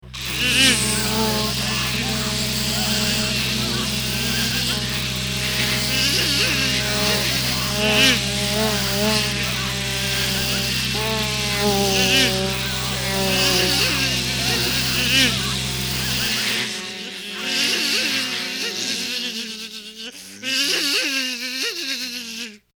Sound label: Bee Orchestra